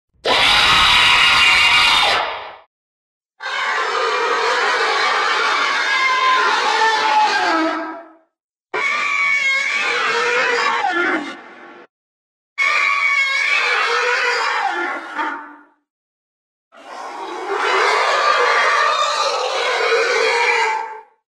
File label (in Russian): jutkie zvuki; dlya momo